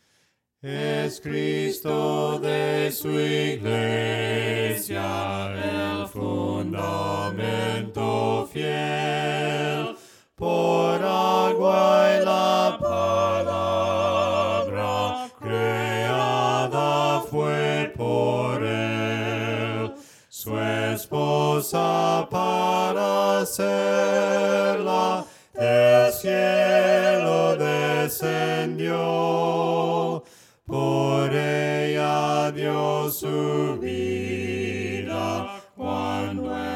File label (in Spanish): VOCES, himnos a capella; cantos del camino; ES CRISTO DE SU IGLESIA